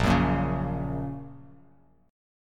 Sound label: Bb Minor Sharp 5th, Bass Standard, Bbm#5 chord; strummed; JGuitar